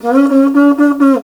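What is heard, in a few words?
Afro Horns, horn phrase; Roland LCDP11 Africa VOL-1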